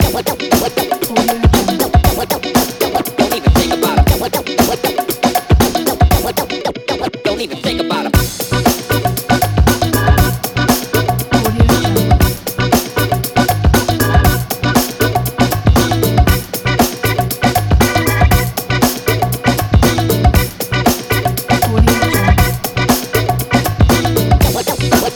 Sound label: retro funky positive breaks